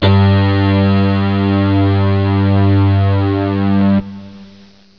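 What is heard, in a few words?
SYNTH BASS G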